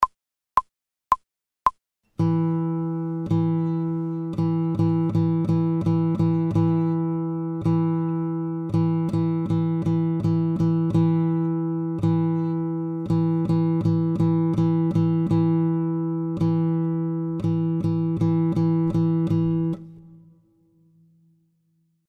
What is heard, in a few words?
fast without metronome, guitar